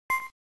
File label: power; WarioWare Inc